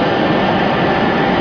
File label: run